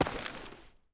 gravel, material actor step; gravel2; Soundscape Overhaul

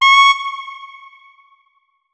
notes, saxophone; Hideout Furniture

